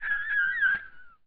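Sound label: Special Photo Barcode Forest Environment Bird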